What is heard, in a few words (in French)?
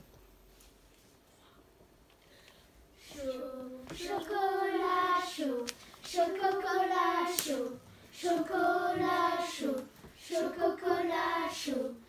Canon chocolat chaud